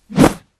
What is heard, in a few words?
heavy textured whoosh